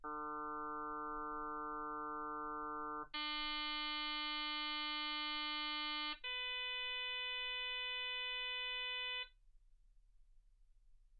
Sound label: harm sinus 48kHz